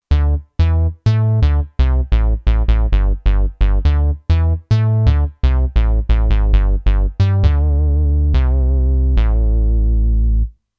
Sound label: House Bass, Kurzweil K2500X; Factory Programs